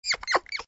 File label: mouse med; open toontown resources; dial